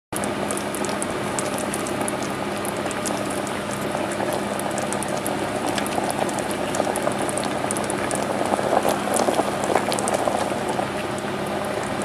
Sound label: Kitchen environment